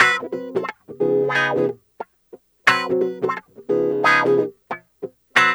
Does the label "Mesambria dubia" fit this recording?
No